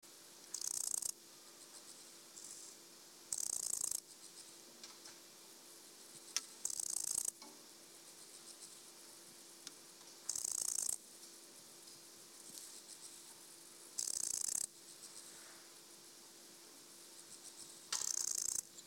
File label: Chorthippus albomarginatus lab recording